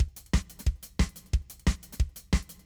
Intro Drums; Helios Remix

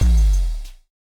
95bpm Nolead End; Bakugan Champions of Vestroia

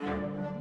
Suspense Start 50bpm; asterix obelix slap them all 2; Music Dialogue

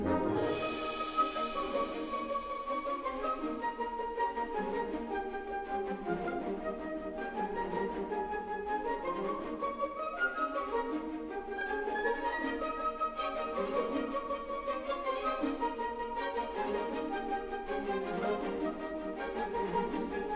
Music Cutscene Comic Loop; asterix obelix slap them all 2

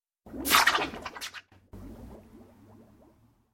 Cable Whoosh

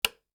Switch

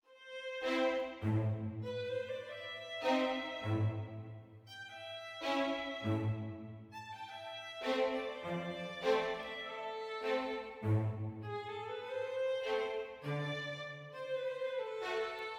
mysterious med

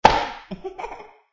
Peeing poke low

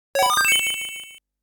Coin; SNK Arcade Sound Digital Collection Vol. 03

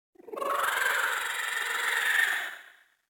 Electric mombo Scream

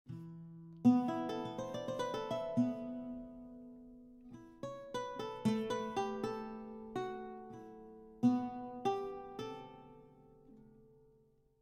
baroque guitar; gamerip

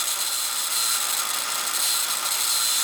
Saw Loop; Puddle; puddle plus ios gamerip 2018

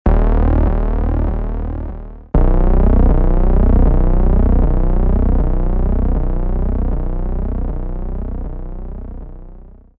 ominous synth sting; AirMech Command VR

